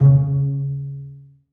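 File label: basses pizzicato c3; Sonatina Symphonic Orchestra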